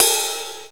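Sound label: ride, Yamaha DD-11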